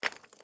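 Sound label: ui drop charcoal; Rust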